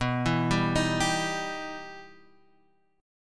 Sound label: E Minor Major 7th